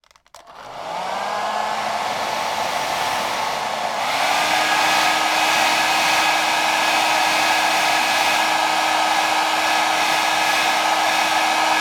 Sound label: hairdryer on operate off; household